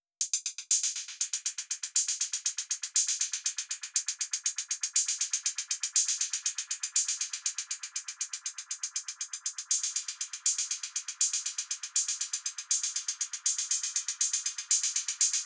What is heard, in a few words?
Hi Hat; Sonic Foundry; Sony Creative Software; Hydroponic Hip-Hop; Beat Components; Hi Hat Cymbal Patterns